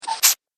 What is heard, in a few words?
Cartoon Pumping Sound